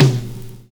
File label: TOM FILL